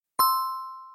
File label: hydrogen, bells; build-an-atom